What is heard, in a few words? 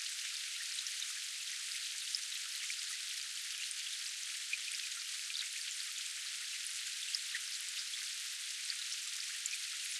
rain light